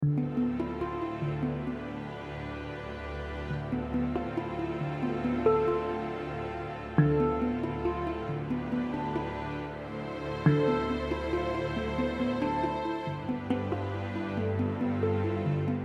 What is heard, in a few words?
hang drum strings improv